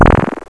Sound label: game dying